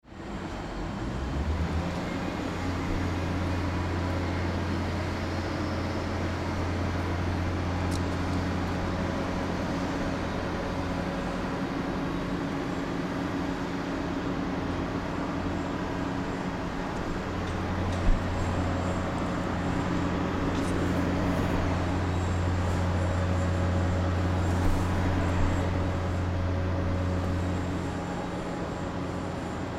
mobile crane

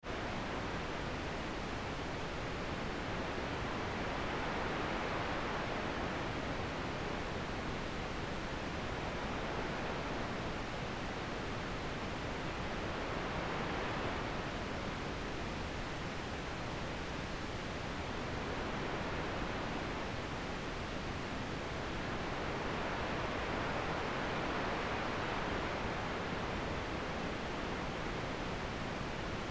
wind

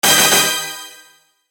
shock

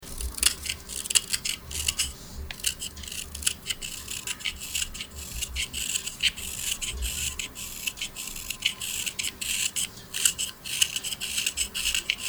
craftsmanship

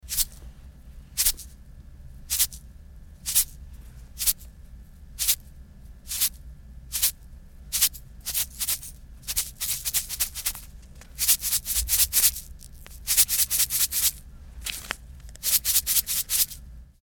stick sugar

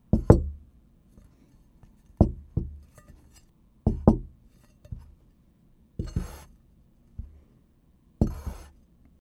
dish place

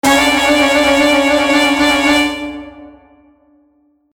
anxiety

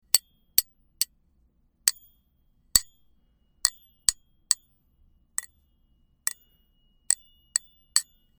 bottle hitting

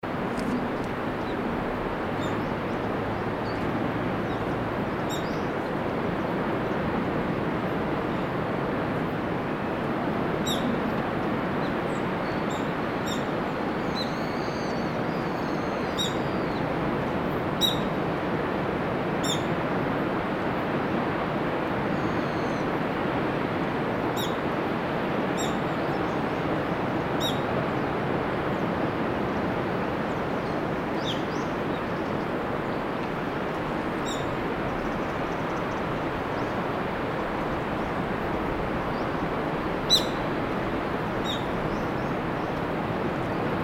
city long

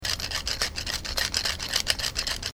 pepper mill

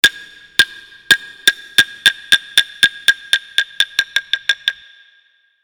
hyoshigi

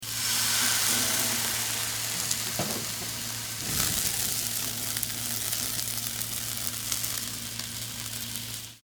flambe

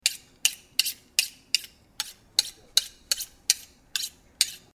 knife

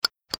lighter

